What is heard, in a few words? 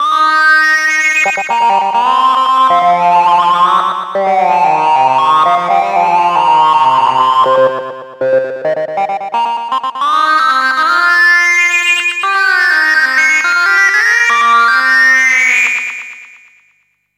SyncLead; Harley Benton D Seed